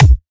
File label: Hard Punchy Kick